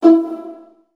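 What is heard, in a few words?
viola pizzicato E2 right, Virtuoso Strings; ILIO; Kirk Hunter Virtuoso Strings VOL-2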